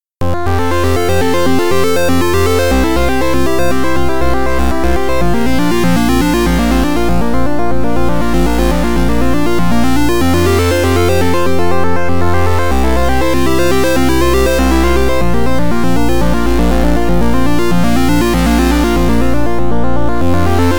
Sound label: ARP